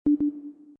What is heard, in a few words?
alert; sn va web client